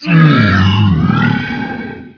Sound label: lowroar